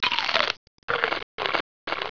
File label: COW CHEW; Kids' CD-ROM Bible Fun Pack; Education Publishing Concepts; NOAHDEMO